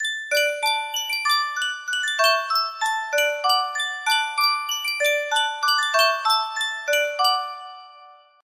Sankyo miniature Spieluhr

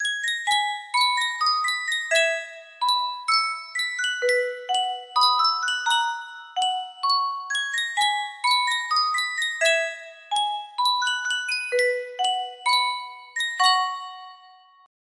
spieluhr; sankyo

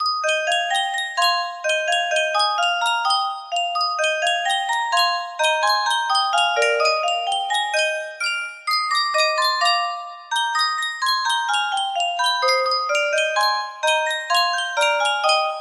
Yunsheng Custom Tune Spieluhr

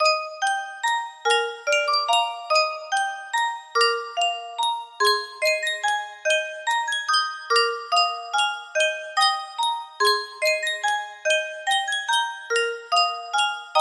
sankyo spieluhr

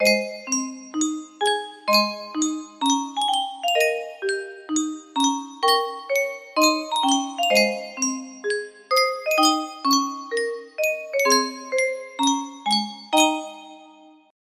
Spieluhr, music box melody

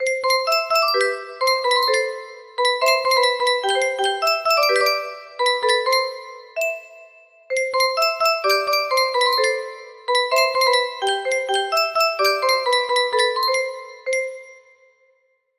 Sankyo Custom Tune Spieluhr

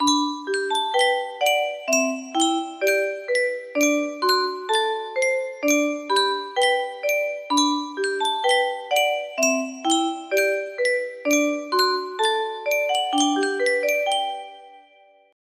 Yunsheng Spieluhr

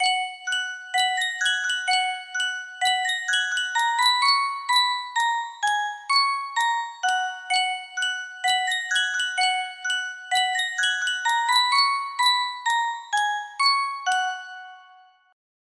Spieluhr musette in D major, music box melody

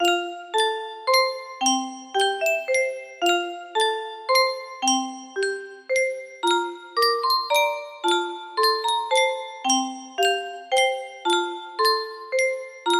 Spieluhr, music box melody

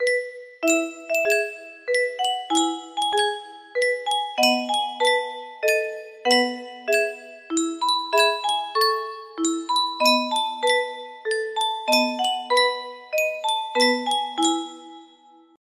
Yunsheng Spieluhr

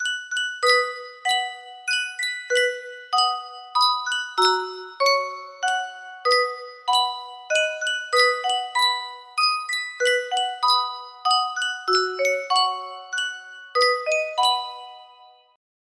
Sankyo Spieluhr

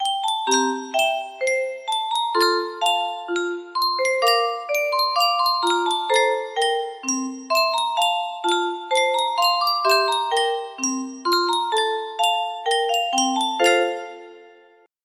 Yunsheng Spieluhr

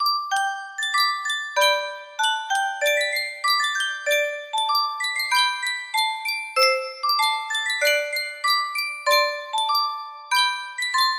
Sankyo Spieluhr, melody; brahms

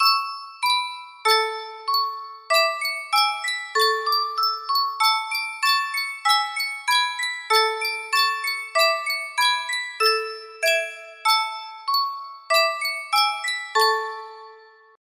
sankyo spieluhr

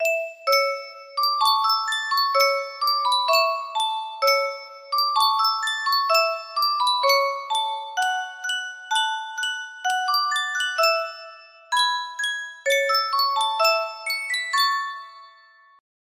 Yunsheng custom tune Spieluhr

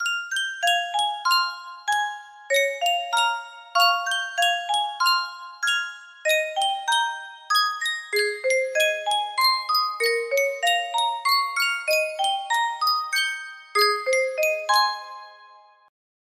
Spieluhr

